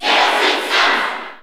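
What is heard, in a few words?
Cheer Japanese, crowd; Zero Suit Samus; SSB4; SSBU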